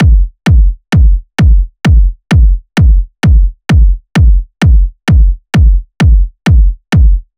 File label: Kick Loop 130, Techno; Ghosthack; Free Techno Sounds 2024